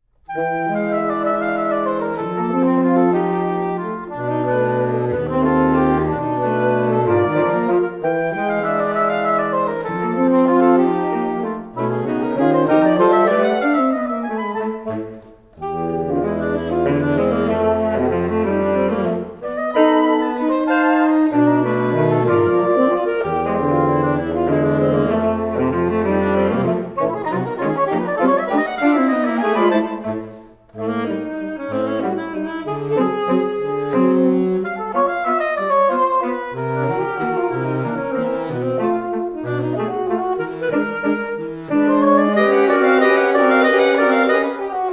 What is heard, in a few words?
saxophone quartet waltz